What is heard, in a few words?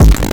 grind, EMU XL-7